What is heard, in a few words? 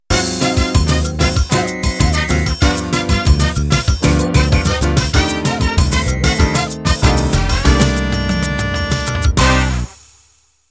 Samba De Sol End